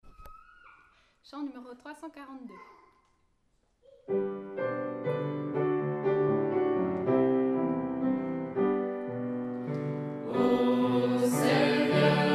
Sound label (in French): Chant; FR